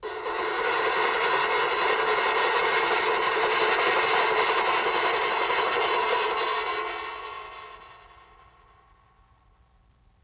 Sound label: LoFi Metal Scrape; Vintage Cartoon; Foley